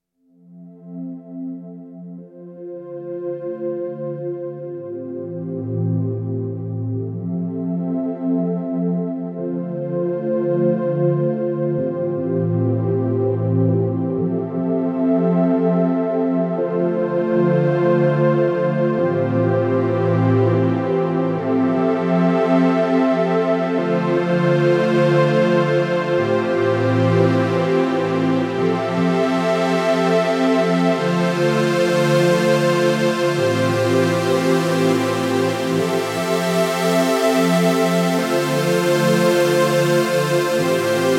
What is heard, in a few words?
OB 6 Modern Pad